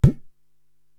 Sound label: Baya Mute; Korg TR-Rack